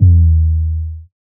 Not Nice 808's

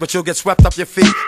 96 BPM

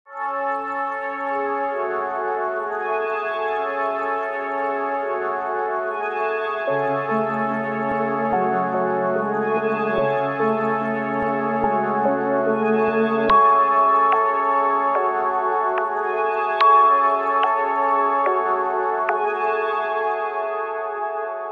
145 BPM

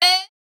Chant

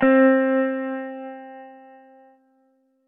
Guitar

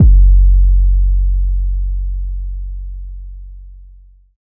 808 - F#; Cymatics

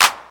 clap

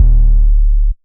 808's

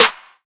Snare

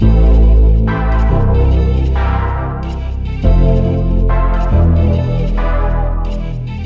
BPM 140

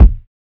Kick

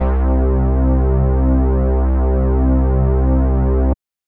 subbass

